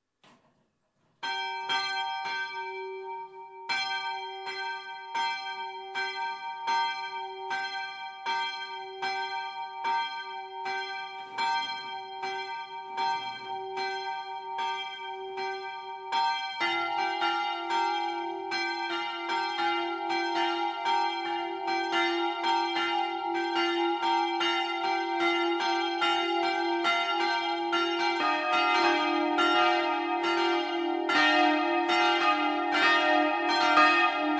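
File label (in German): Tonaufnahme as1 b1 es2 f2 g2, mehrstimmige Geläute; mehrstimmige gebrauchte Geläute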